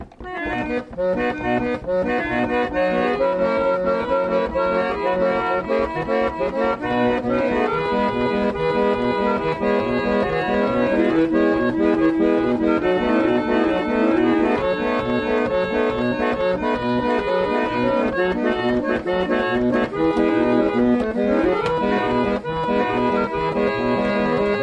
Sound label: Concert Roller Organ; 1906; Serviced by Harold Draper at Roller Organ Restorations; Comes with 3 original cobs and 3 reproduction cobs